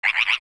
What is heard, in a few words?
Cackle; Guay